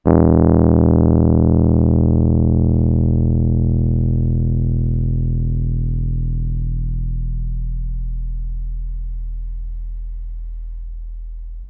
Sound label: Rhodes Bass E